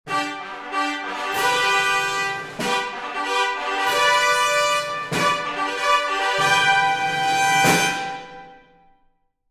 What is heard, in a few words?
fanfare